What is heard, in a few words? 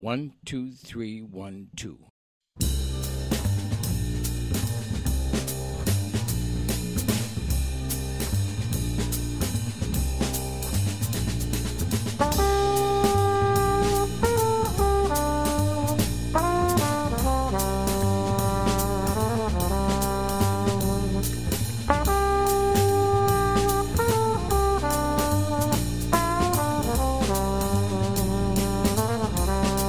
minus piano